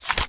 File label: reload start load; gmod